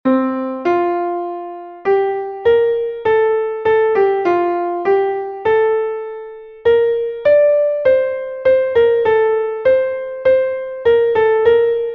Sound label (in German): klavier; MelodieMeister